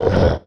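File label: lizard hit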